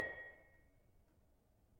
Panerus 6A Sharp, gamelan tuned; gravityharps

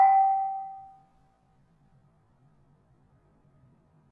Barung 4 G, gravity harps tuned

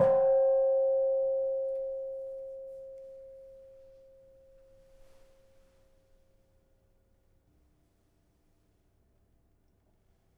Kenong Sl 1, gamelan; gravityharps; timbrebox

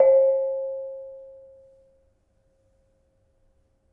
Bonang Panerus Sl 1, gamelan; gravityharps; timbrebox

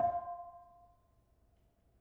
Bonang Panerus soft, gamelan

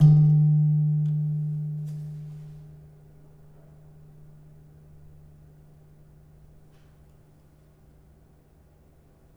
Slentem, gamelan; gravityharps; timbrebox

